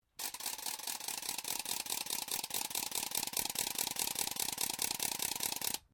NOISEMAKER PLASTIC SPIN LONG, Cheap percussion toys